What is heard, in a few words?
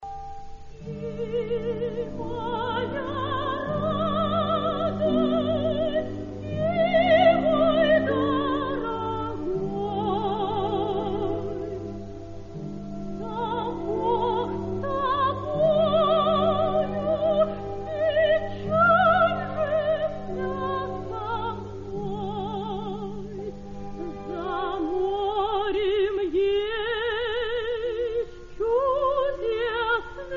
lullaby to son opera; madame-butterfly